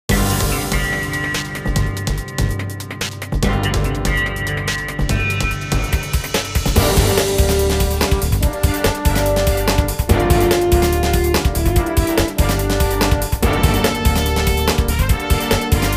BGM STAGE; Pokemon Battle Trozei; Pokemon Link Battle; Nintendo 3DS

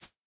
Menu Move; Mixolumia; Minimum

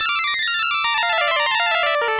Game Start; Centipede; GB

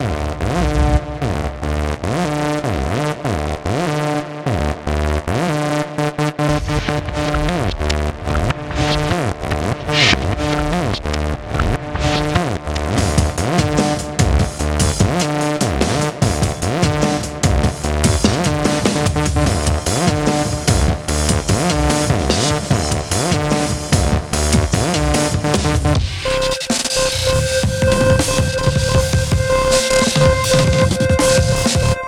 synthrock; Avoid Sensory Overload

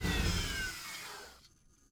vehicle time machine turnoff; teardown ps5 windows xbox series xs gamerip 2022

